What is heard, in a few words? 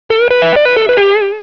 GUITAR